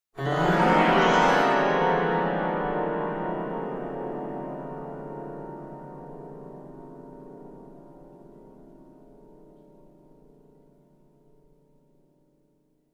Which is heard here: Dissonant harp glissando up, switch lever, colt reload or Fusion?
Dissonant harp glissando up